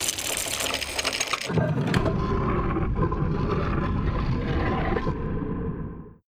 cylinder inserting opening

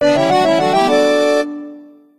Se Win

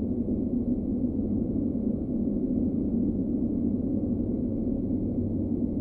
exterior tone low; adams venture origins

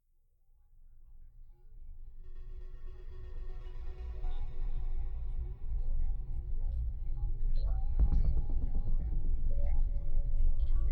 Xeno Ambient; junk jack mobile

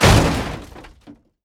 Car Damage Small

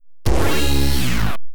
Combo Fanfare; Getaway: The High Speed II; Williams; pinball; arcade

